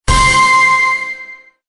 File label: swoosh